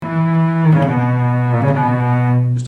violoncelle son

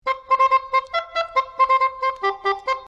cor anglais son

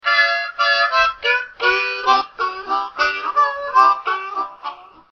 harmonica son

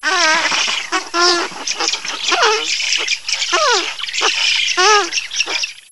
spatule cri, cris d animaux

